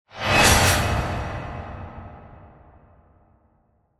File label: Whoosh To Hit; BlastWave